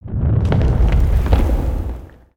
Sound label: Earth Quake Rumble Rock Slide; ninjago